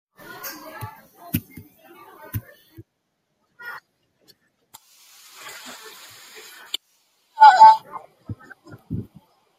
ooooaaaaaaaaahhhhhhhh

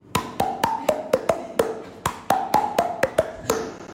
song

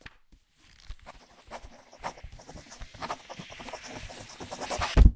ghost writing

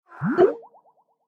skype for linux recieved contact

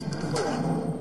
baseball shot chin

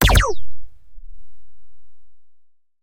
double laser gunshot

